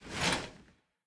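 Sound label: movement armor metal; Smite; Cabrakan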